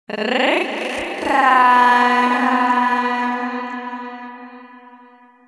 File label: rrrrrragtime